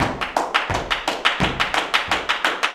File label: JALEO TAP, Flamenco